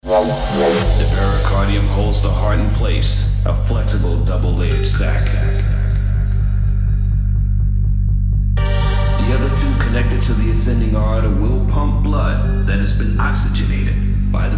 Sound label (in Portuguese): eletronica; cd bass extreme